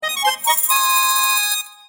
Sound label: Board Game SFX (Yes); animal crossing amiibo festival